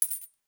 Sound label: Coin; Fantasy Interface; Fantasy Sounds Bundle